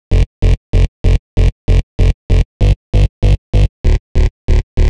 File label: TEK BASS